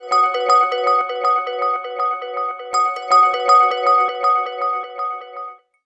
ALARM RINGTONE

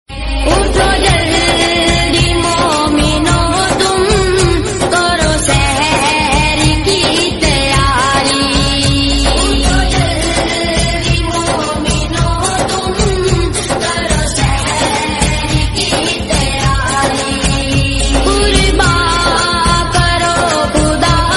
ISLAMIC RINGTONE

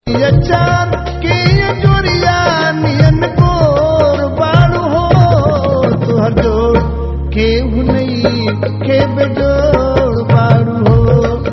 BHOJPURI RINGTONE

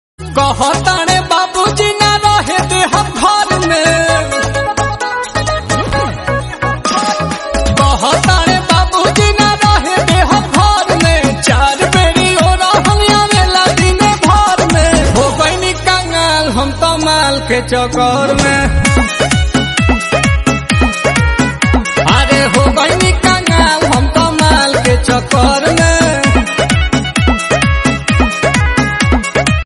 BHOJPURI RINGTONE

